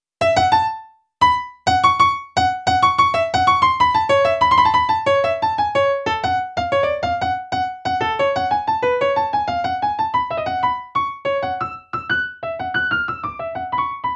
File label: Piano Solo, Cinematic Funk; Sony Creative Software; Urban Tone Poems